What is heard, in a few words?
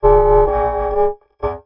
bassoon